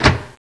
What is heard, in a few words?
switch; sven; caged